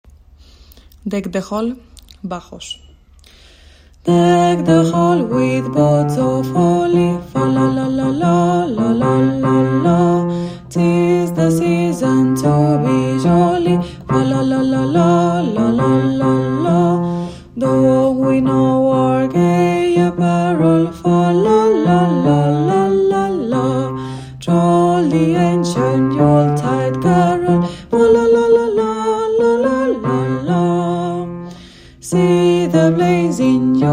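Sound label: bass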